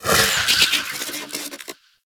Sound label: Shockwave Moa Grapple Proj By; Warframe; Venus; Venus Terra Shockwave Moa; Abilities